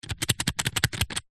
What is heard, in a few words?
office chalk sticks pack shake